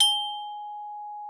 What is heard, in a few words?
G#5, vibes; Xsample VOL-4